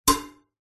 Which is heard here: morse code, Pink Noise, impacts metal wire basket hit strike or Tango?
impacts metal wire basket hit strike